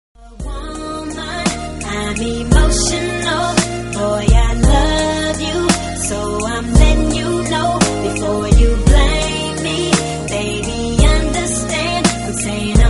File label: Emotional